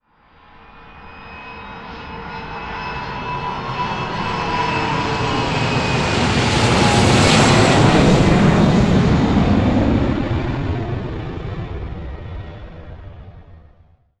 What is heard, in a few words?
airplane